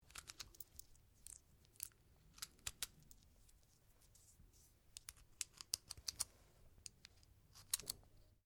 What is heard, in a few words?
RIFLE CZ 452 VARMINT 22 CALIBRE MAGAZINE LOAD; A selection of domesttic firearms; Rifles; Guns